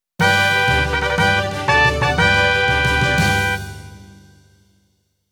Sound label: fanfare